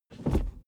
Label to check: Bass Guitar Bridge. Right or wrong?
Wrong